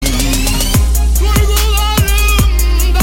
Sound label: arabesk trap remix